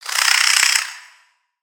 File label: Spider Angry